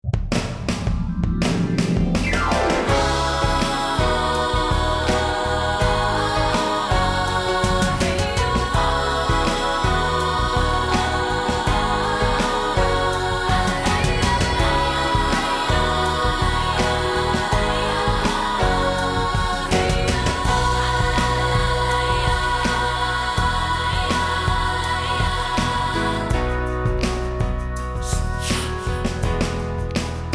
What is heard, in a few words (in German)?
Gesang